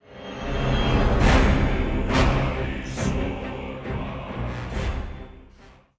Stinger; Pegasus; Deathwing

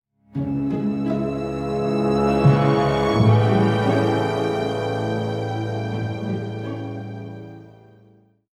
Play Stinger; Wrathion

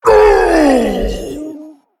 Male Slime Abomination Death

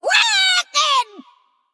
Female Beast Attack

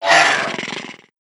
Whelp Attack; whelp token

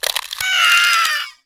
Swamp Dragon Egg Play

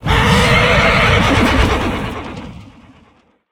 Attack

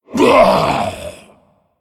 Male Pit Lord Death

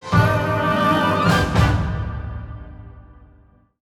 Stinger; Flobbidinous Floop

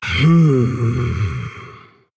female orc, Thinking

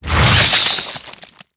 Tentacle Death; Tentacle Of N Zoth

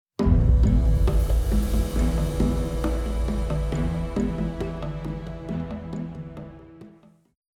Primary Play Stinger; Loti

